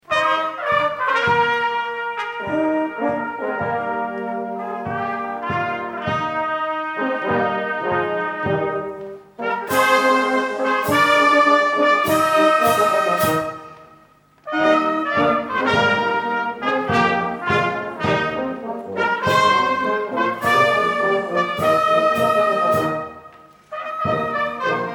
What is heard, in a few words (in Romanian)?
Fanfara